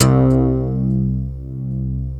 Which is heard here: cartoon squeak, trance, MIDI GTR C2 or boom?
MIDI GTR C2